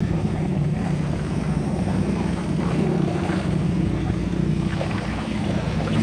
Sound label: distant motorboat engine revving and water sloshing; boats